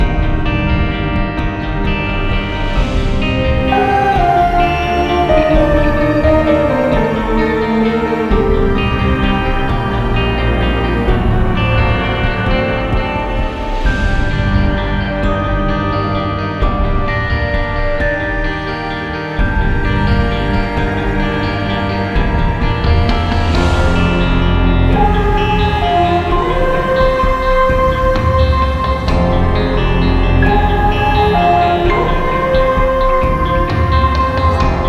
Light Loop; The Binding of Isaac Repentance; Genesis Retake Light Loop

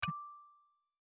Chip Landing; my cute commissar 2020 gamerip

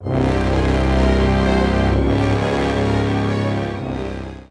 Medieval Agitated; Civilization Revolution

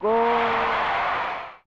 Goal; Battle Soccer Field no Hasha; snes

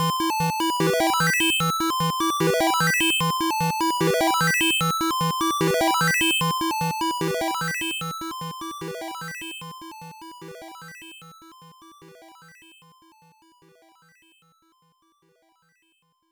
Powered Up; Megumi Rescue; System E; arcade

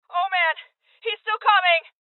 Heavy Hit Female; Voices HeavyHit Female

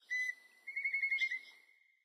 Amb Jungle Birds

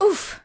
grunt Trip Female; gamerip; temple run

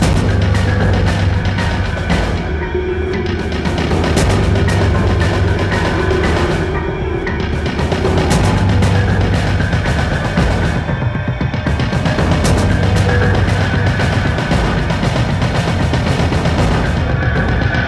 Screamer Attack Loop; Silent Hill Downpour

